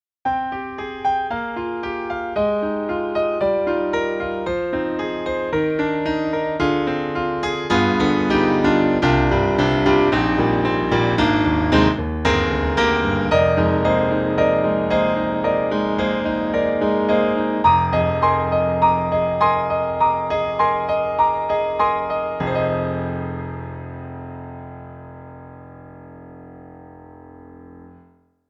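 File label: CFX CONCERT GRAND, Classic Rock, piano; GARRITAN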